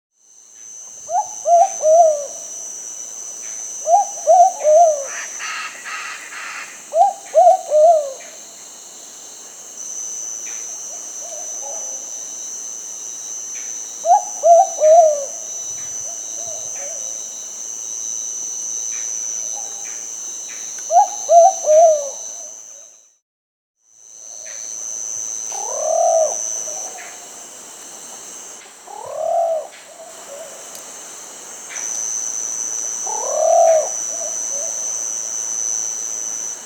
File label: Plumbeous Pigeon (Columba plumbea), Peru; S. America